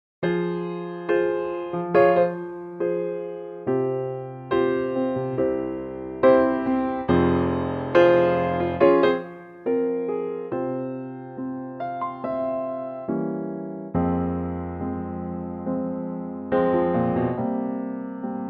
Ab Piano; key - Ab to A - vocal range - C to Eb

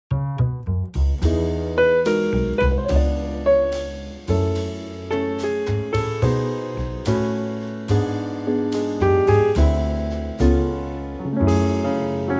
Trio E

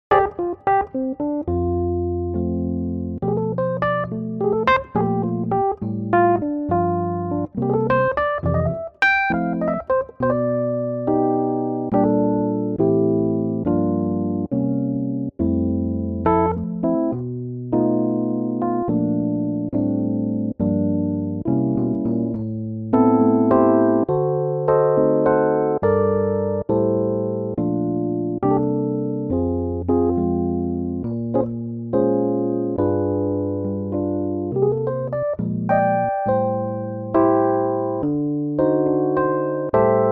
Rhodes F

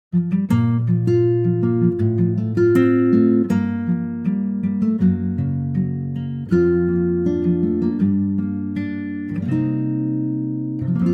Bb acoustic guitar; key - Bb - vocal range - F to G; Stays in tempo all the way

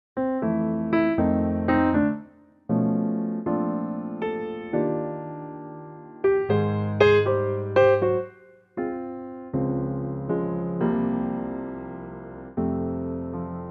F Piano; key - F - vocal range - G to Bb; A piano only arrangement

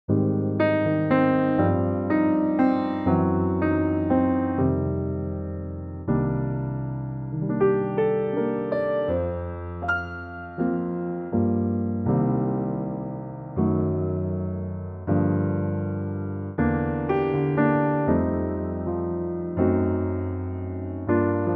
Bb Trio; key - Bb - vocal range - Bb to Db; with the verse included, all staying in tempo

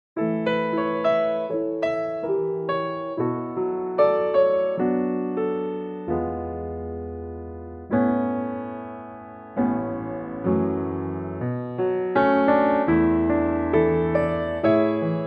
piano

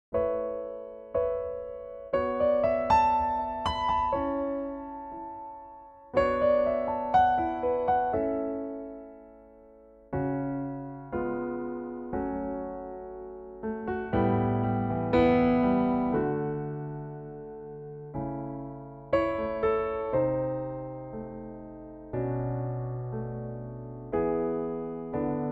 A piano; key - A - vocal range - (optional E) A to C#; Pianotrak

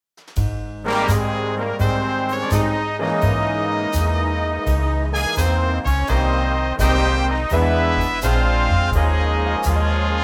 Ab Big Band; key - Ab - vocal range - G to Bb (suit higher female ranges)

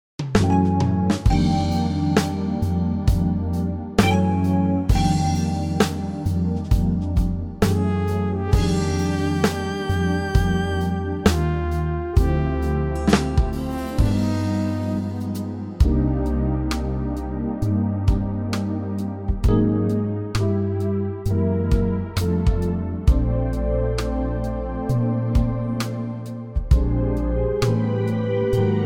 Bb smooth; key - Bb - vocal range - C# to Ab

